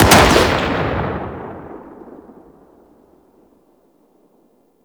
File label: Sniper Shot 3D; Warframe; Tenno